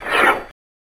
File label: Hedge Shears Cuttin